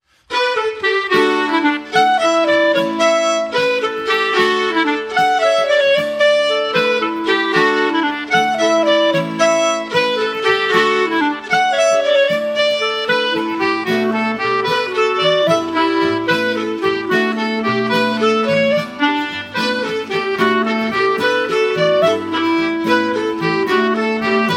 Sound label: Old Lancashire Hornpipe